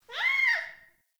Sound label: Trapped Beaver Call; Encounters; Animal Rescue